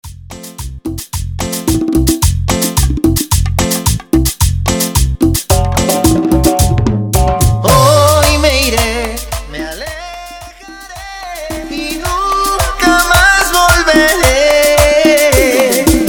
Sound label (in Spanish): Acapela, Intro Percusion Percapella, 110 Bpm; walter qtsca dj; short edit 2k26